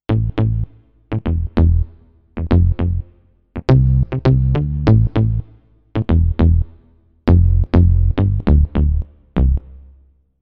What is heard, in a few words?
Yamaha Sonogenic SHS 500 DX Bass